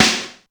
acoustic snare sound a key, Royality free snare one shot tuned to the A note